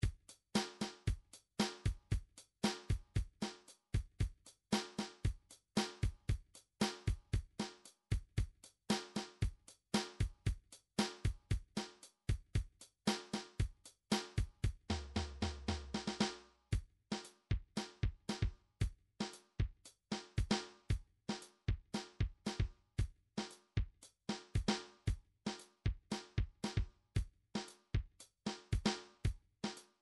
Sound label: drums pop